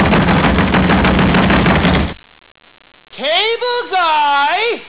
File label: knock; Cable guy